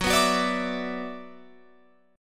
Gb 7th Suspended 4th Sharp 5th Gb Guitar Open F, Gb7sus4#5 Chord; Gb7sus4#5 strummed